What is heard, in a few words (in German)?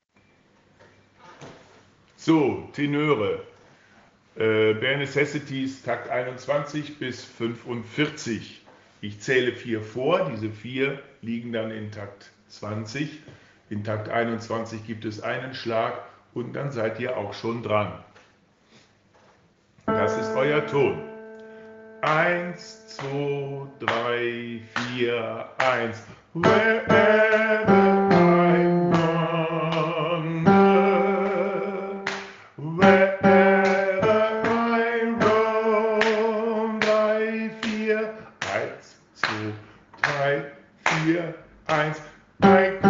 Tenor Arbeitstempo